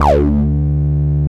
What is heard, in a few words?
303 D2; Jack Sparrow; Deep Dubstep Progressions